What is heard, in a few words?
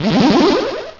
Teleport; battle anims; PokeRogue